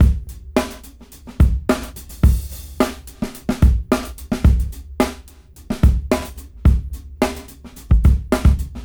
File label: Brown 105 BPM; AMG - Keith LeBlanc Phat Butt Jams